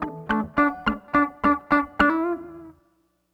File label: Garage Funk Guitar, 70s Analog Funk; Sonic Foundry (Sony Creative Software); What it Is!